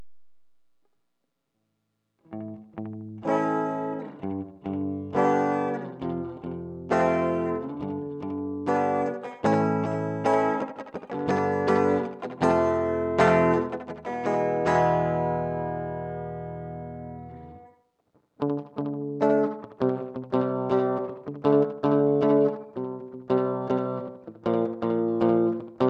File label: Clean reverb